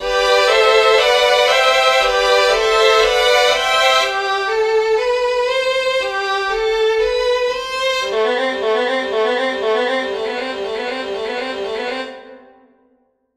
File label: Violin Rev, fades